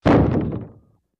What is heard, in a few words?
Rock falling ( cartoony ); rocks